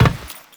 Step Metal, Footsteps; Warframe; Teshin

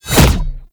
Bayonet Melee Combo; Warframe; Tenno

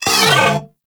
Moa Vocal Pain; Warframe

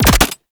Concrete Archgun Mech; Warframe; Archwing

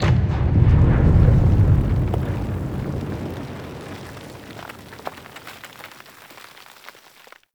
Battle Ambient Tunnel Rumble; Warframe; Tau

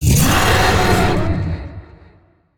Vocal Accent; Dialog; Warframe; Zariman; Void Angel

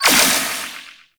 Health Leech Hit; Warframe; Eximus

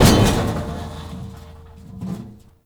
Destructible Metal Barrel; Warframe; Ambience; NineteenNinetyNine

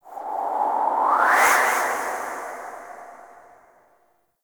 gas city distant wind textures; Warframe; Corpus Gas City; Ambience

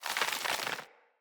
Squid Plant Uproot; Traps

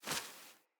Wing Flap One, Animals Foley; Floppet

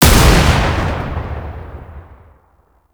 Alt Fire Shot; Warframe; Scaldra AK

